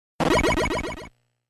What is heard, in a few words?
Teleport